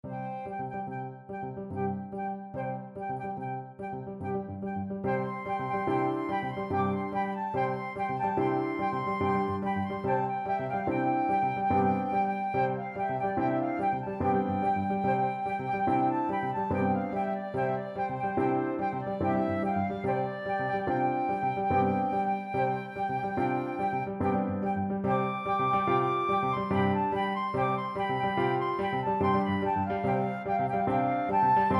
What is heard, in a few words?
bolero, flexible ensemble and piano 3 players and piano